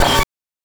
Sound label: BANK2 SFX, NES DPCM; MIDINES